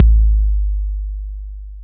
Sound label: TR-808 Kick